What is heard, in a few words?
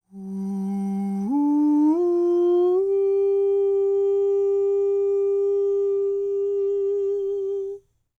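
falsetto, Vocal Planet Jazz FX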